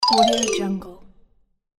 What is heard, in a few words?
comical scene change continue heartwarming